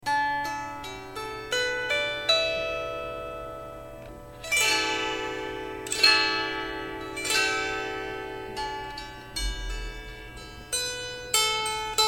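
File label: my little lyre, pentatonic 7 string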